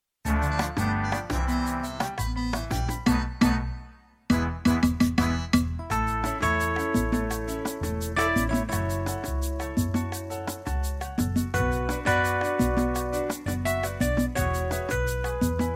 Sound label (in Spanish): Salsa